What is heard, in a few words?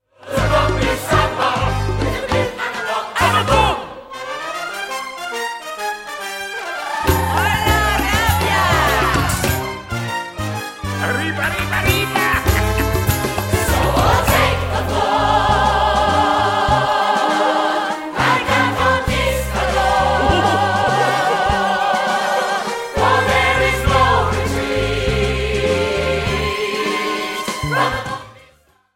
ensemble samba; bombie samba